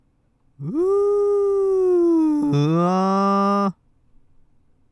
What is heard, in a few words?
glissando mix